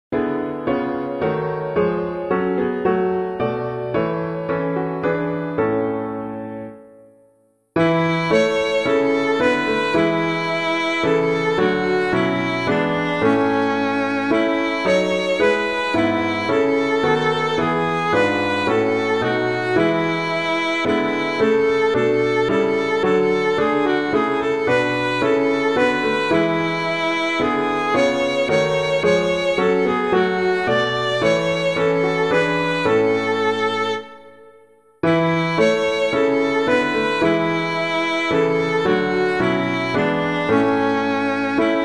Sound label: O Praise Ye the Lord Praise Him in the Height, piano